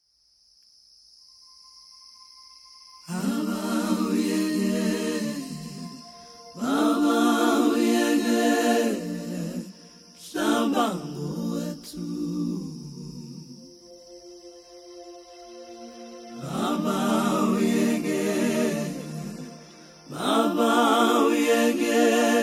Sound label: Interlude